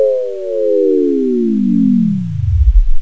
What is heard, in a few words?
FOURIER